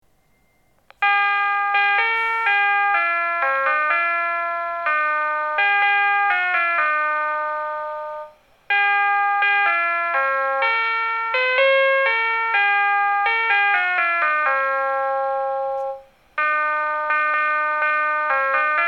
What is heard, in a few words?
Numazu Scramble